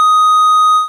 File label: IIIIHT; Burning Rubber 1.2.3